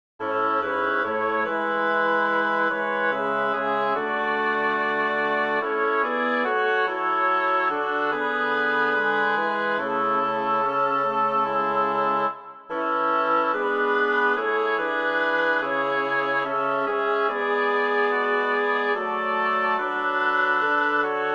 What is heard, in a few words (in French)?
bois